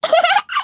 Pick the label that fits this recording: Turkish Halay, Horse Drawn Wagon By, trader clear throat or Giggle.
Giggle